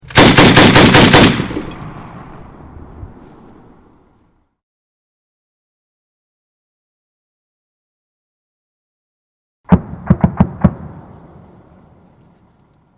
M2 Burst Fire Distant Explosion, M2 Machine Gun Burst with Distant Explosion; Machine Guns; VRSonic